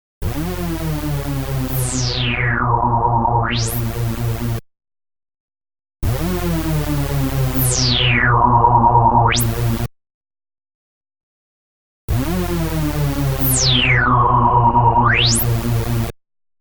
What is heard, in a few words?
Filter Normal Clean Boost; TAL-Pha